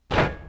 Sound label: hit; XBOX; Crash Bandicoot The Wrath Of Cortex